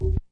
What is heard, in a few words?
cover vibration, Scratching and Turntablism; DRUM LOOPS and BREAKS